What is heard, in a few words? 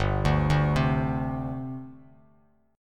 G Suspended 4th, Bass Standard; arpeggiated; 3,5,0,5; JGuitar